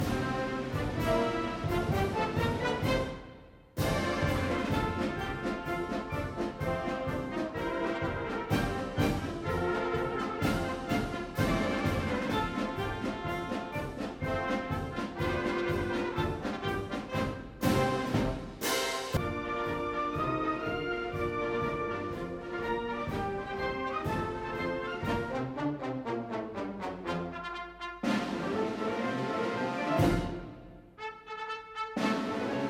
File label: Bass Drum Crash Cymbal Etude; The Thunderer